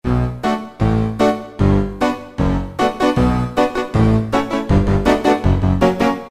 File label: Tack Piano; Roland PN-D50-02